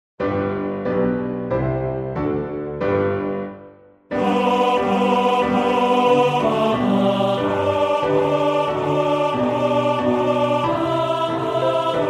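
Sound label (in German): Tenor, Männerchor; Mendelssohn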